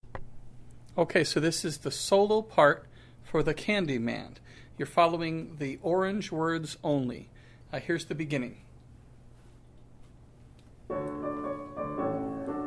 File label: Solo